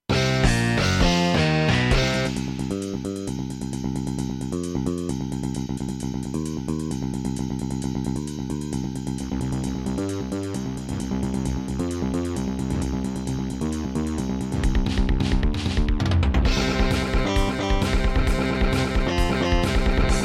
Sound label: Ensoniq VIVO 90DB-A; sigil doom episode 5